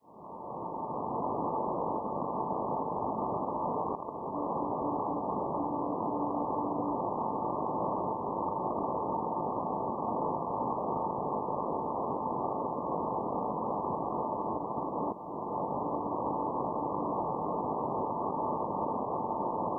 NDB 380 kHz; HO; Colmar; Francia; Perseus; Schwarzsee

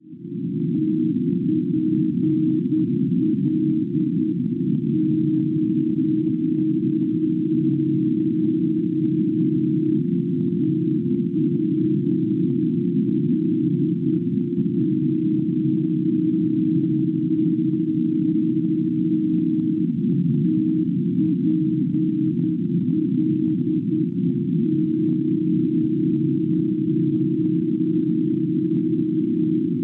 NDB 348 kHz; TPL; Topola Serbia; Schwarzsee

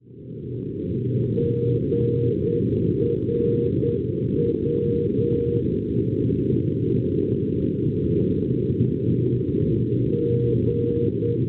NDB Grafenrohr Germania 405 kHz; GRW; Schwarzsee

